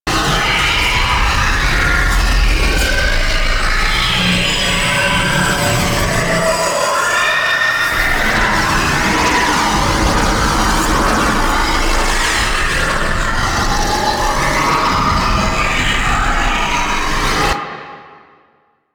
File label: Paralyzing Screech Loop; Warframe; The New War; Archon; Boreal; Abilities